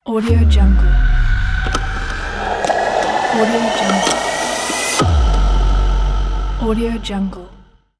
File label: documentary logo; bodmusic